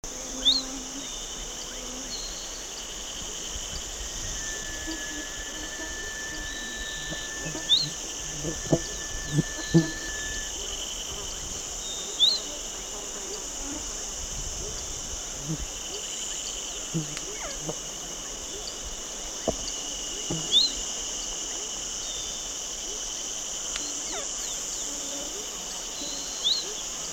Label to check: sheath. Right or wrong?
Wrong